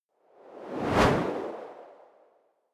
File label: whoosh simple hard high